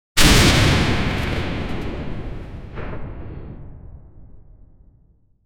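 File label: a sword slash with thunder, electricity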